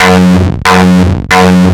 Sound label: 138 F#, pure-synthl, Techno; instrument-loops